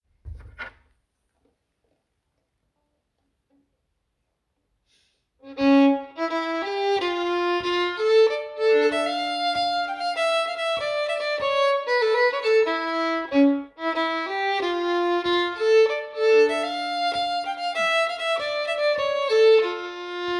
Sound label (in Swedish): Polska från Ön (moll) - stämma